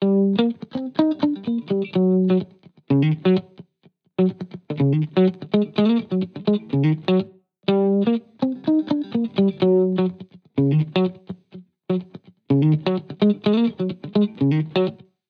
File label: Guitar Bpm125 Key D#, 70s Guitars; Fox Samples - Back to the 70s Guitars